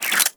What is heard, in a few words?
Hand Shotgun Spin, Weapons; Hand Shotgun Prime